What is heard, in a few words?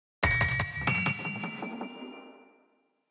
building upgrade stinger